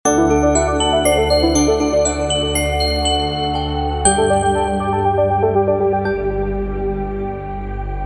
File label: level up